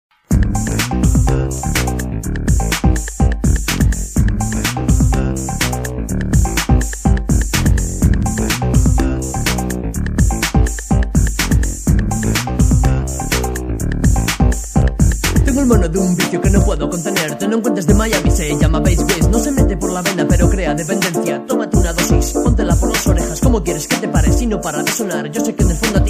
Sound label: Bass Waves